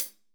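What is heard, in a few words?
12 HH CLSD, hi-hat closed; Best Service; Real Mega Drums VOL-1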